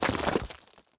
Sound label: dirt break